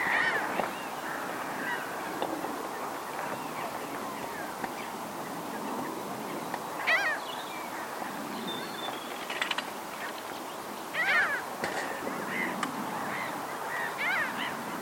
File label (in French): mouette melanocephale; trilbardou